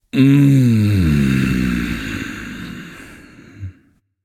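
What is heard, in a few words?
non verbal content, voice; cernunnos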